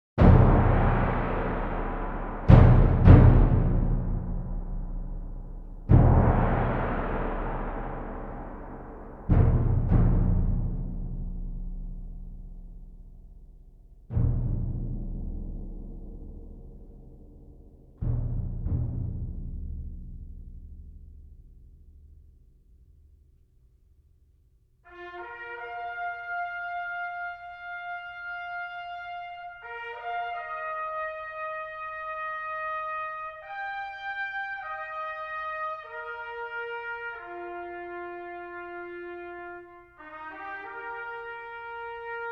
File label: Fanfare